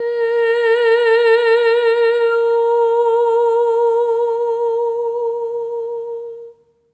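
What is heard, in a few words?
soprano eh oh A#4; Spectrasonics; Symphony of Voices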